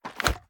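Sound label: sniper raise quick; gmod; iw8; xmike109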